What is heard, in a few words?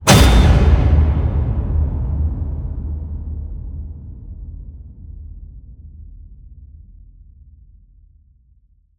HIT Massive, Cinematic Hits Designed